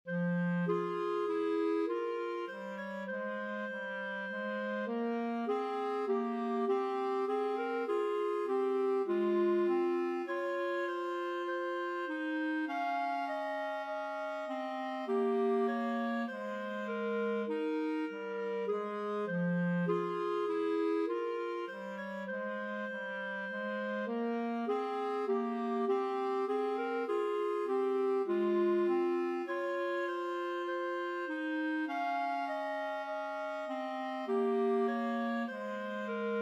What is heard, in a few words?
clarinet duet; bach